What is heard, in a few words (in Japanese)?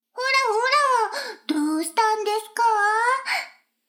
シコシコ音声; 逆時間停止されて